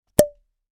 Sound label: Champagne bottle cork popping